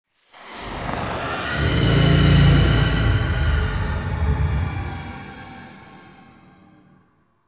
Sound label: Exhale Interior Small; Ambience; Warframe; Sentient Lair